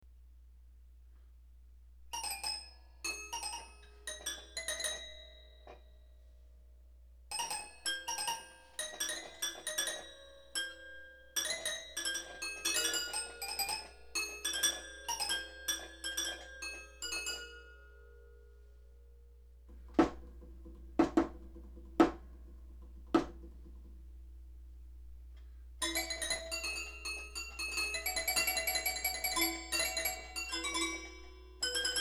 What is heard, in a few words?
Spiel Zeug, Toy Piano